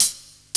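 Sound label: ASIAN HH, hi-hat; Crypton - DrumBase5000; CD DRUMS #2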